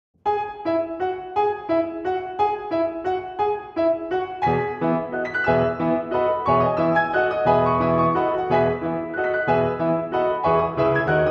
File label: L' Arlésienne, Arr. Solo Piano, Suite; Grainger